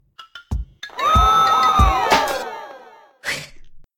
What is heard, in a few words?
Snd Cheer; Knuckle Sandwich